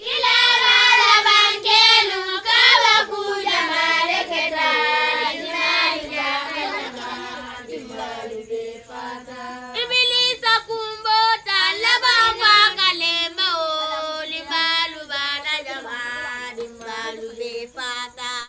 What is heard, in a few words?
africa chant children; best service Blue Box